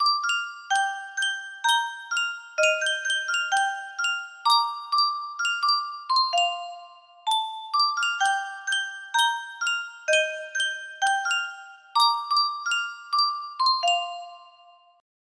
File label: music box, music box melody